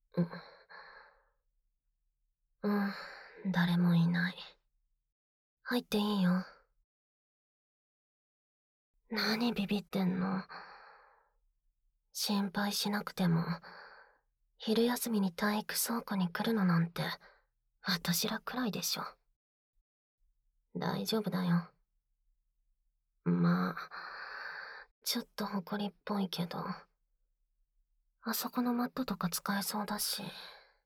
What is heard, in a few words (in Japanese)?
抱きしめ合いながら密着耳舐め素股, ASMR